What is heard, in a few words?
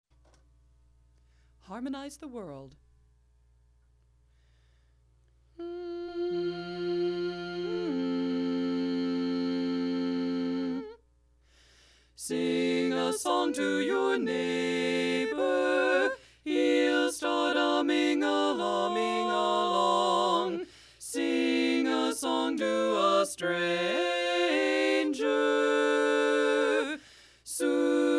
Harmonize lead miss; Har lead omit